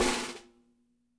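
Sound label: piccolo snare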